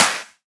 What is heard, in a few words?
clap g sharp key; percussion; single hits one shots